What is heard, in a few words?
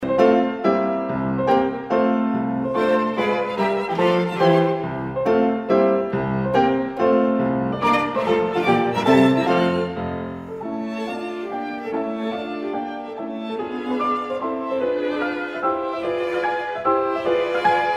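piano quintet; goldmark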